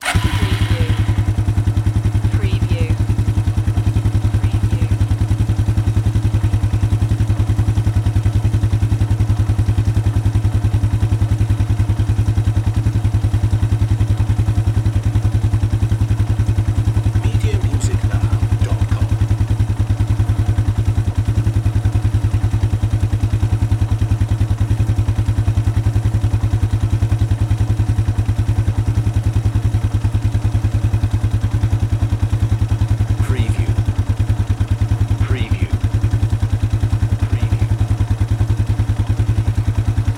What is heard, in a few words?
Quad Bike Start Up Idle Shut Off Engine Perspective